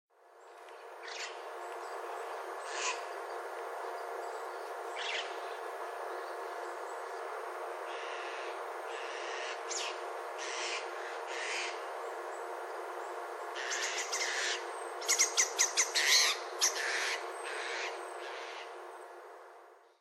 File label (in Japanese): mukudori, itoman